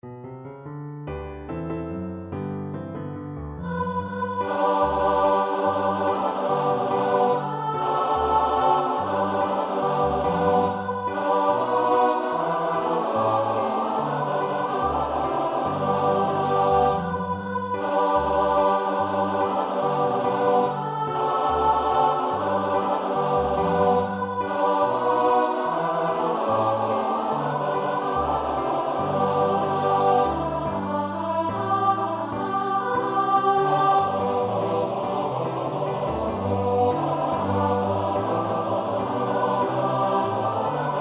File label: Good news, S-A-T-B